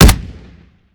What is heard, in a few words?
fire; gmod; viper